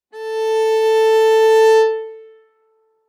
Viola arco ff sul A A4 stereo; MIS Pitches - 2014; Strings

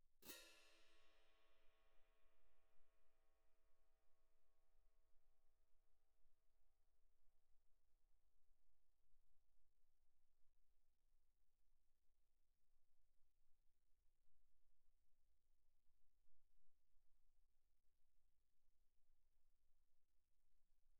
15 orch crash, pp, crash cymbals; bandaturka; Percussion

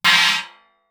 chinese choke crash, Suspended Cymbals; Percussion

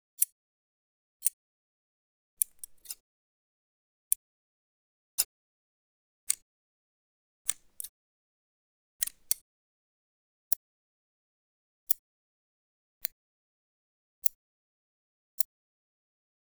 Scissors; Soundmined

